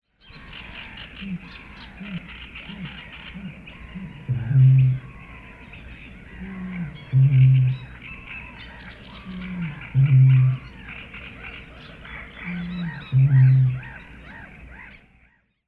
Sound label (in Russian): uhaet v sumerkah mnogo ptic